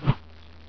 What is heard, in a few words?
woosh; the hat chooses the wizard